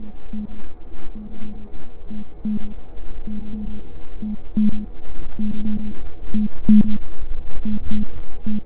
sonar slow

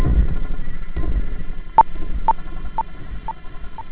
spook beat

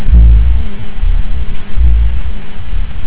bass stoy

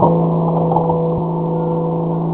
gear

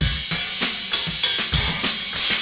jazz beat

